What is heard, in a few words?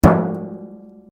RUBBER ON METAL HOLLOW; Materials